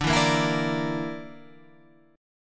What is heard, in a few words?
D Suspended 2nd Sharp 5th D